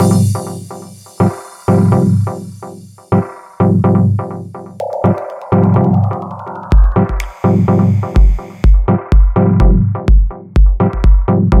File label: Deep Drums